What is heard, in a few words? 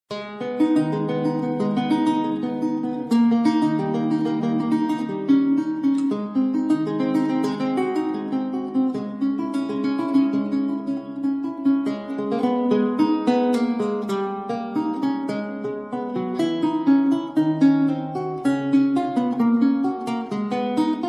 prelude; de visee